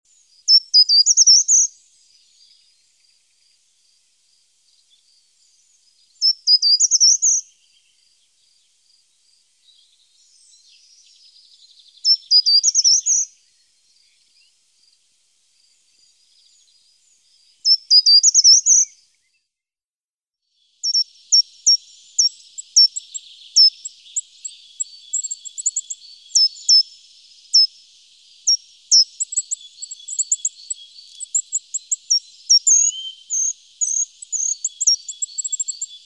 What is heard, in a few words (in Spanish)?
agateador comun